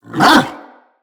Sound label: Tame Bark; Kubrow